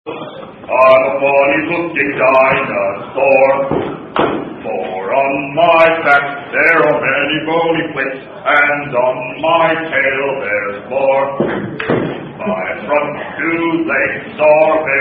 Stegosaurus Song, Variant Sung By Military Personel